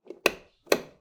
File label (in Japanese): 和風 電気を点ける音; light